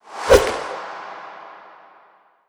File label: Woosh